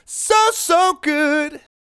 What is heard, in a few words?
So Good, Male Vocals